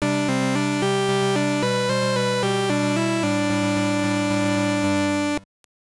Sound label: Jingle; Shougi 2; GBC